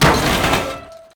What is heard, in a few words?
objects, World of Tanks